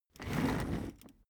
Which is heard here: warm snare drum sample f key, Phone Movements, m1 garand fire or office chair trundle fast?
office chair trundle fast